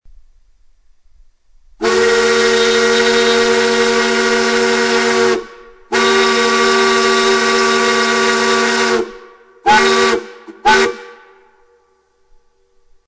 candy factory, steam whistles; Powell 6″ 3 Chime; cass railfan; Recorded at Cass blow during the annual whistle blow.

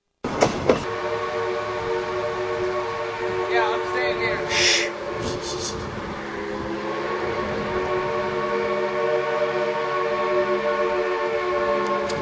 3 chime, steam whistles; Crosby; NCRy

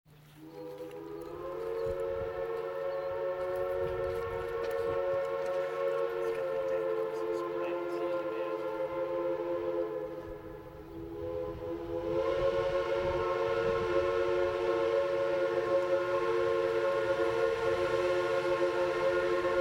Whistle, steam whistles

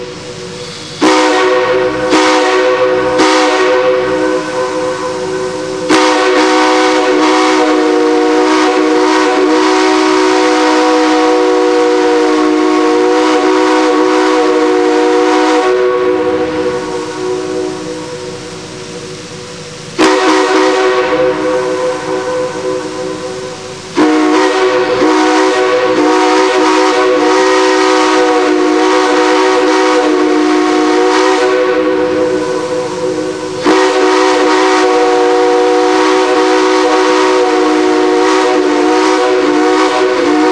3chime closeup, steam whistles; C&O

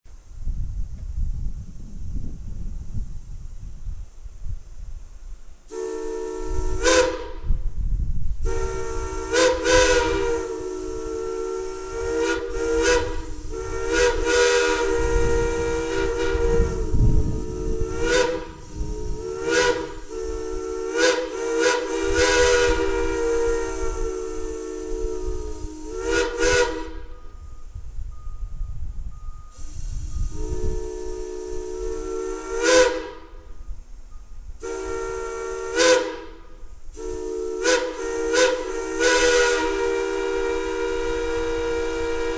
whistle, steam whistles

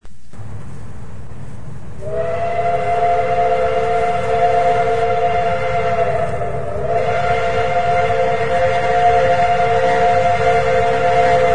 chime, steam whistles

